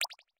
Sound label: Screenshot; Xiaomi MIUI 9.5